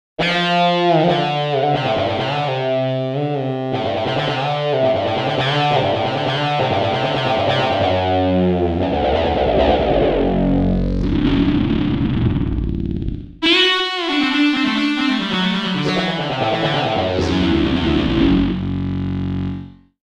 lead; sonic charge; synplant

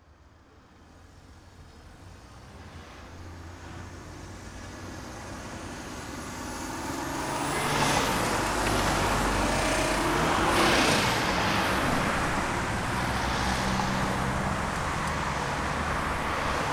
traffic

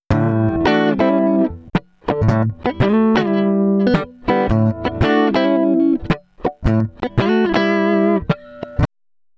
guitar dry; audio ease speakerphone

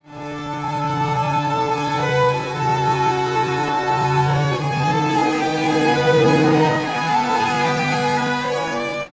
strings, pitchshift; digidesign pro tools ts

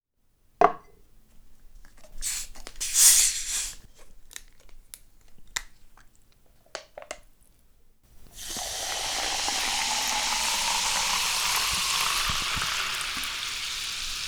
cheers; olympus ls-10

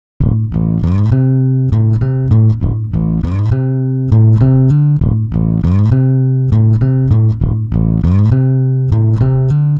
pitchshift bass; celemony melodyne

